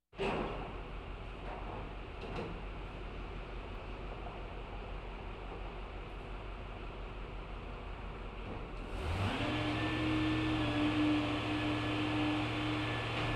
müllwagen beim aufladen; Großstadtgeraeusche

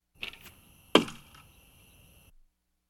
pfeil ins ziel schießen; Sport

